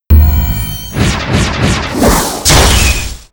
Windy Witch Skill; Seer; GamigoNA

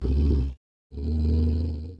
goblin swordman idle

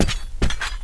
Run

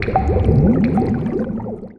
Dark Fog Walk & Run; Seer; GamigoNA

